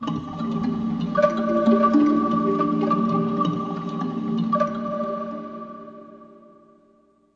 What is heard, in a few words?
Pads; M1; instruments single samples; Lore